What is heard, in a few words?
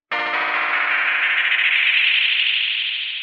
music home transition upward